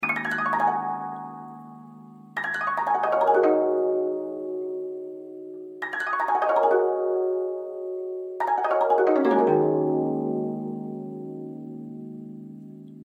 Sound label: ubuntu touch suru arpeggio